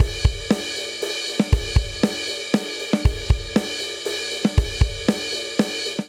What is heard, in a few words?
Straight Three Rock Outro 3/4 118 bpm, Drum; MIDI Clips; Ableton; Factory Packs; Drum Booth